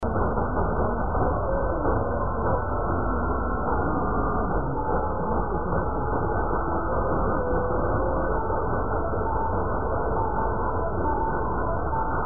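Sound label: Lithuania, radio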